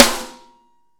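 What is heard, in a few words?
snare ambient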